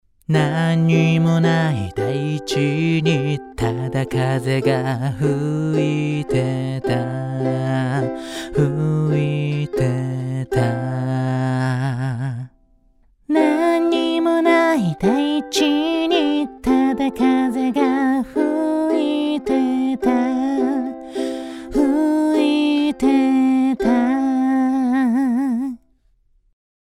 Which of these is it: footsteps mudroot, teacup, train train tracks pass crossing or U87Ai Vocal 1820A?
U87Ai Vocal 1820A